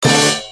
bonus chance; Royal Unicorn

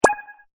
UI menu; Paradise

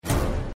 reel spin stop; Ming Imperial Guards

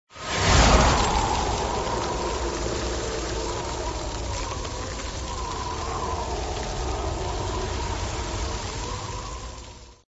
buff ice; Thunder Fighter

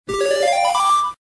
WIN 50; games; Deep Water

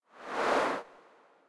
gambler leaf up; Hot Party Deluxe; Wazdan

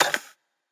reels start; Wazdan

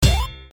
stop bonus; Golden Joker

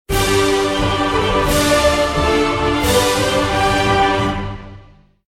WIN MAX; Gorgeous Goddess

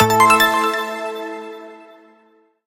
multiline win; Magic Stars 5; Wazdan

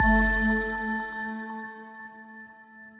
prize bonus; Great Book Of Magic Deluxe; Wazdan

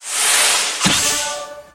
dart; games; Beach Party; wazdan

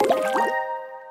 win multiline; Hungry Shark; Wazdan

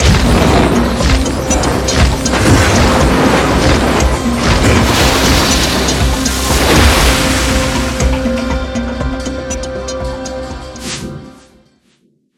megawin; games; Fenix Play 27 Deluxe; Wazdan

